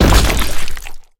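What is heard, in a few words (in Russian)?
одиночный громкий близкий бульк воды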